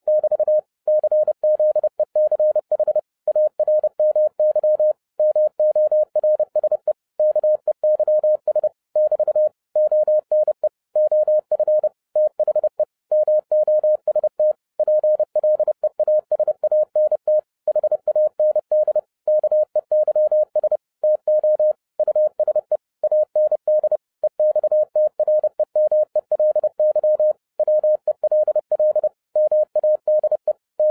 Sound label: Morse Keys 30 wpm; Czech Army